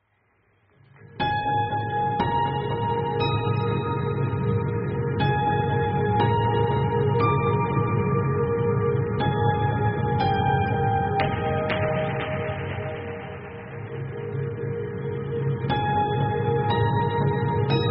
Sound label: mysterious cinematic ambient